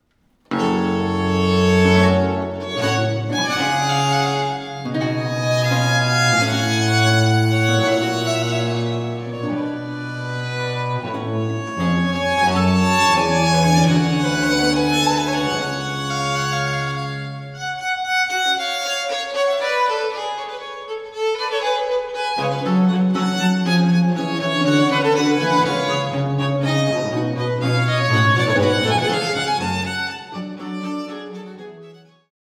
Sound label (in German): Trio in G Moll; Matteis Jr.